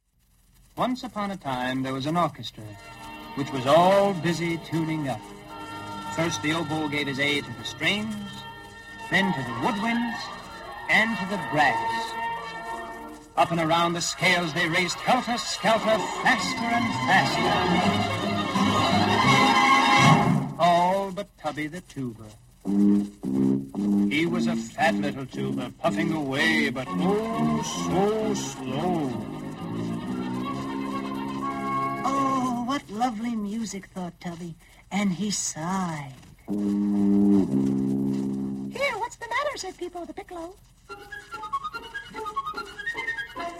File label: tubby tuba; Kiddie Rekord Music Library